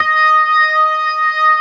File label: STOPS, ORGAN; Keith Emerson Signature Series